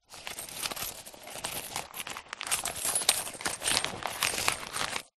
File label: purse pouch leather hand rummage coins notes; zapsplat; foley